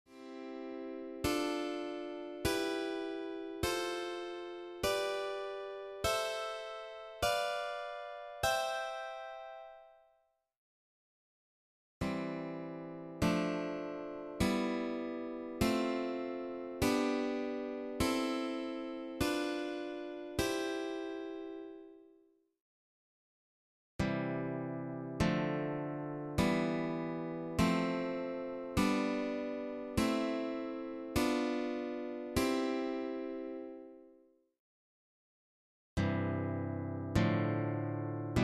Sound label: triads practice, guitar